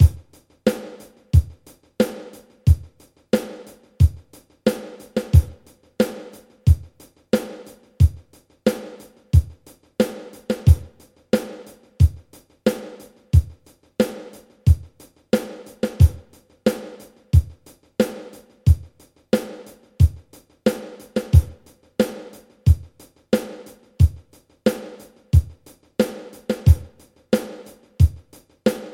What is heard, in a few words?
16 Beat tempo 90